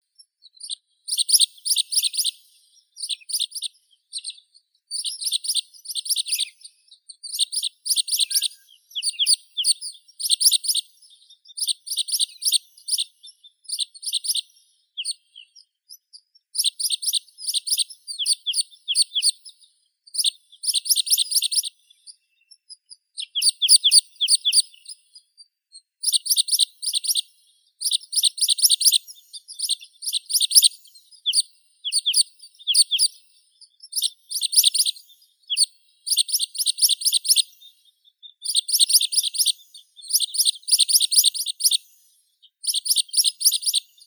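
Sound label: pipit, Anthus rubescens; konek goltsevii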